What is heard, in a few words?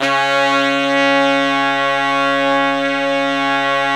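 brass section; Zero-G; Phantom Horns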